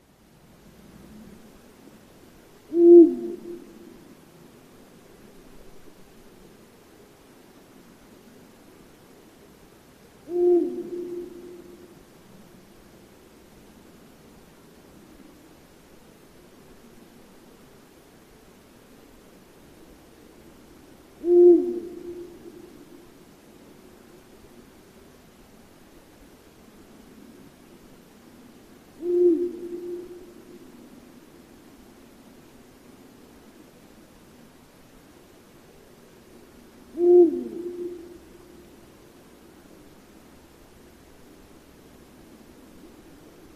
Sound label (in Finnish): huuhkajan; hallikainen